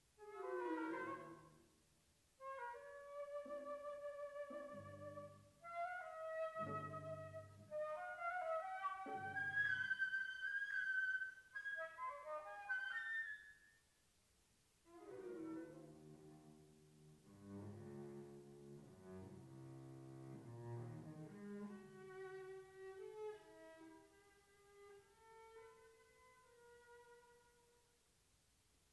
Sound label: Quartet Excerpt